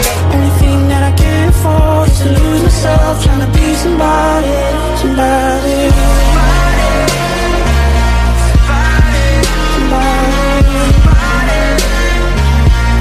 hot electro

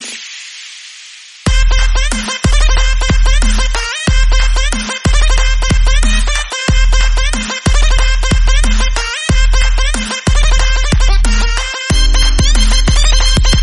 funny hip hop

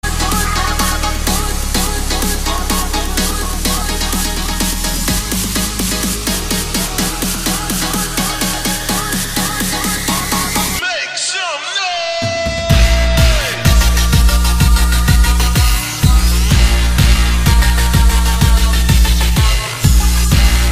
trance; belgium

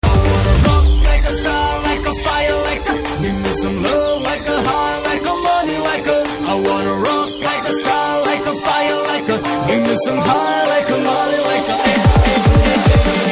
telugu tone

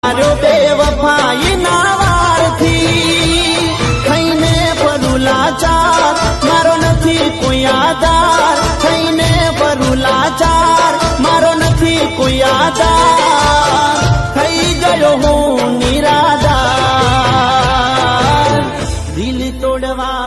sad love gujarati

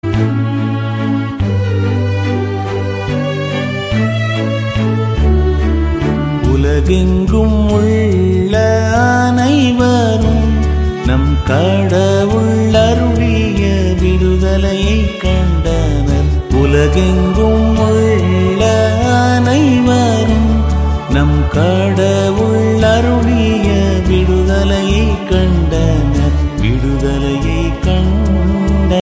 tamil devotional

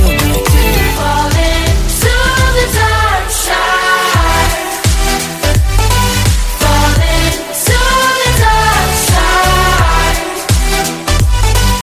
dark side hot electro, ringtone

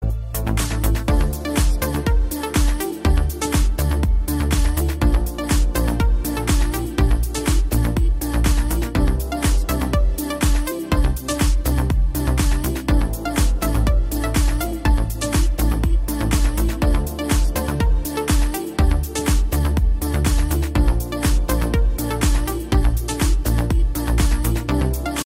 funk; iphone8

